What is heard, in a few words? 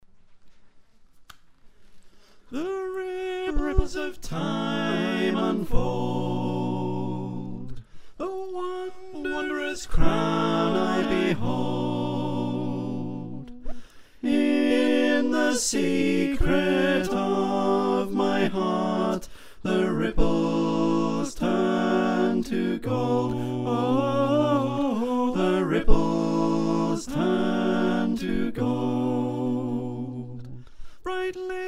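soprano alto tenor bass, choir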